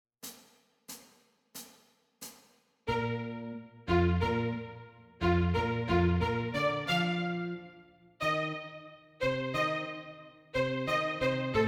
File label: Tempo 90bpm